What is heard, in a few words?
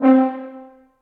French Horn Solo Staccato; Ableton; Orchestral Brass